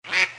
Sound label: quack duck